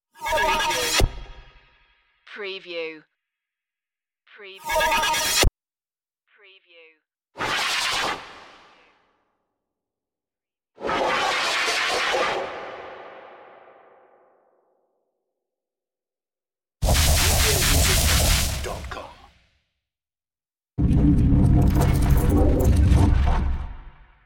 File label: teleporting